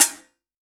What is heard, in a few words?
A1, synth line; 90 s Sample CDs; NiceBeats; SynthLine VOL-3